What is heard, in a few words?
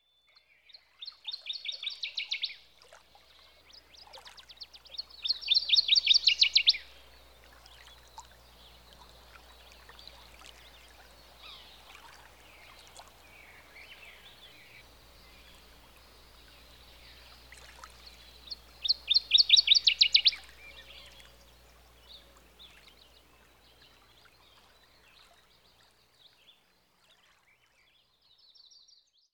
secluded lake; sound oasis